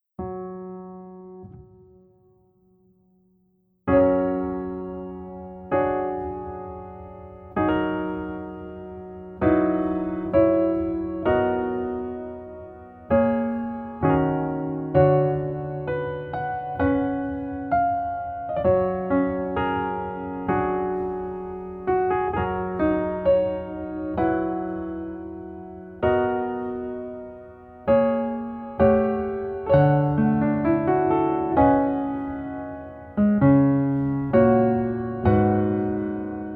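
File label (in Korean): Piano version 여자키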